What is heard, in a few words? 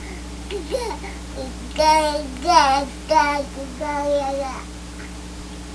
babble